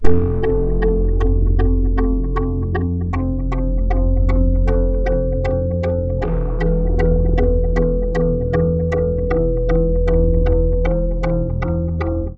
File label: Synth Poly